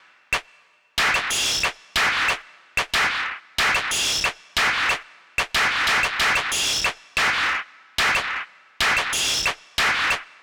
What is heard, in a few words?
Succession 92 Distorted Percussion, Ambient Piano Loops; C and Dm Night Mood